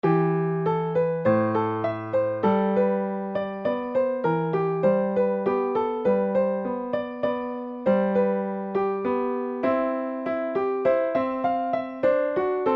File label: invention